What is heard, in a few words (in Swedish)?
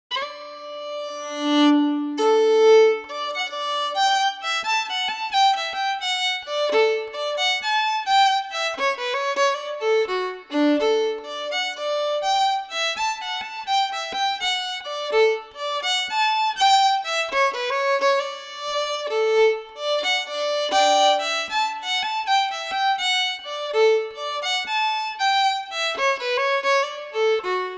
polska efter slow; Walfrid Ronnlund